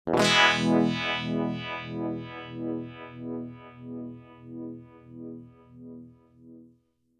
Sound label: strum full depth full regen rate at noon 2 stages